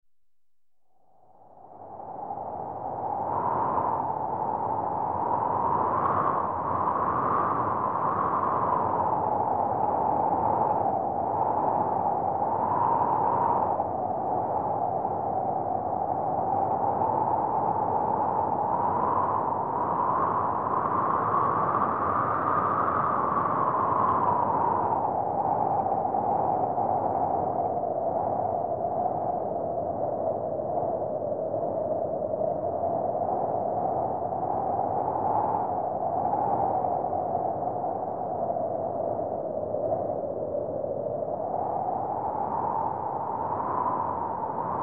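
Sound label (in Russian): ветер объемный 3d звук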